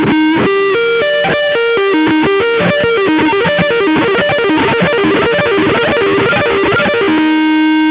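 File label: sweep picking, guitar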